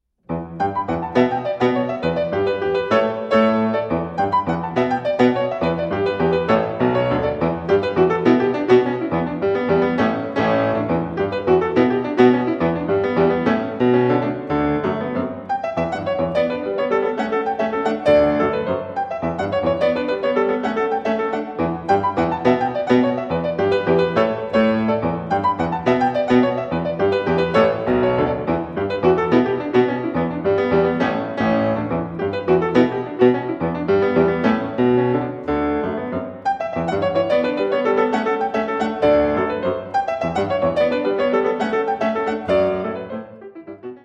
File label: Studies in African Rhythms; 24 Studies in African Rhythms